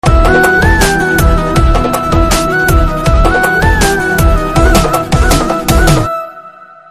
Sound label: BG Flute Ringtone; Shailaja Reddy Alludu